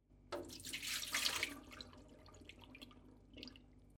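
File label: pouring out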